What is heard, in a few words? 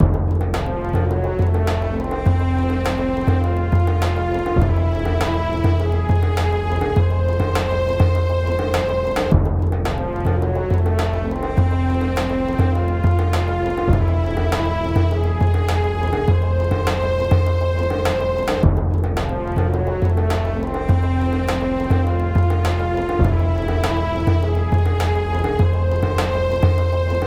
Battle Loop